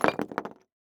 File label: boulder fall